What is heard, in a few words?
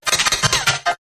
Interrupted Transmission Burst